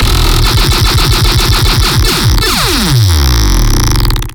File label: Sound FX Lazer Gun